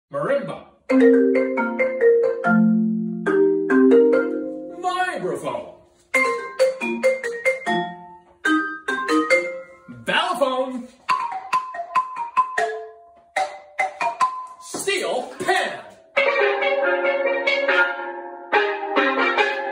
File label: opening iphone ringtone on 4 different mallet instruments